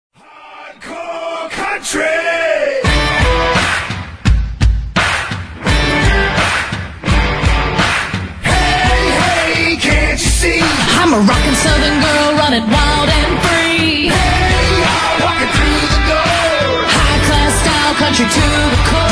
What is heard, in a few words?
hardcore country